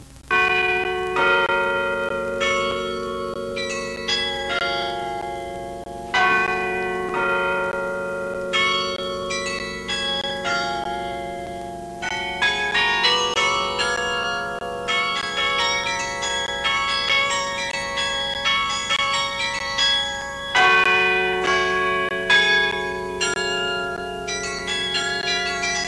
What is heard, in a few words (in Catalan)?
campana